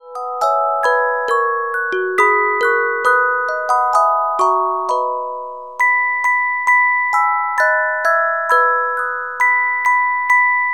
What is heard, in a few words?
Carillon Music Box Melodies